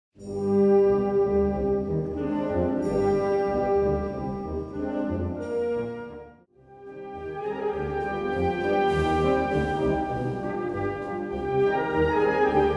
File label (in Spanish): Pasodoble, para banda; SUSPIROS DE ESPAÑA